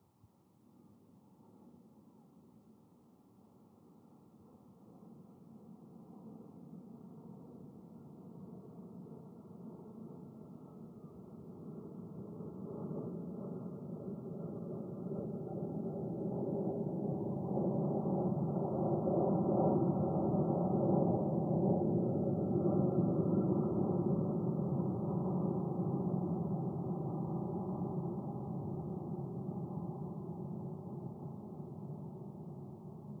Plane Flying Over Dopple Effect

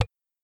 Button Clicking Single

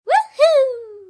Woo Hoo

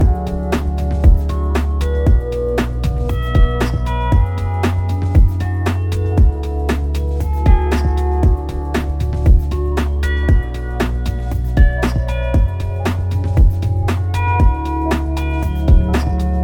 Ambient Rhodes

